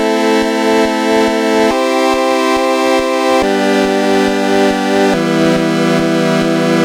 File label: 140 Chord G, 140 BPM; loops; CHIPSHOP DELUXE